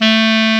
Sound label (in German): Klarinett; Alpine Volksmusik